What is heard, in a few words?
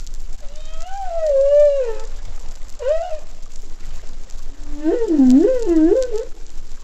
Humpback Whale Short; cetsound